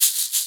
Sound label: ETHNO EXTRA 90; ethno2